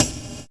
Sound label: percussion, Dance Synths; Mad Kit